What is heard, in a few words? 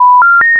error